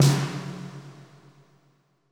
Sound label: Snare, Grime, 138bpm; Zero-G Sound Sense Grime Scene; Construction Kits; Da Suspects